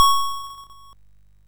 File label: sid MOS6581R2